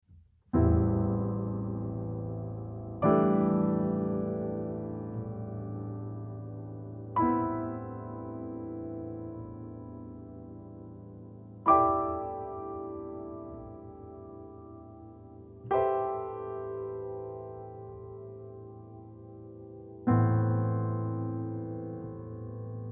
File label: Amin7